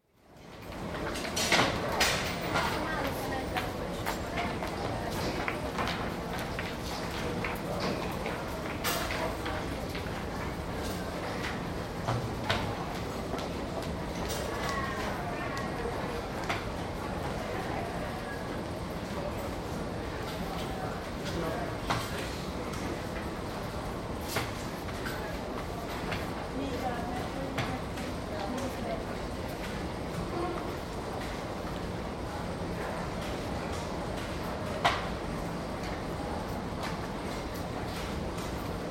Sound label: Metro station ticket office near top of escalator, busy, with footsteps, ticket barrier noise and escalator noise; at Deli Palyaudvar; BBC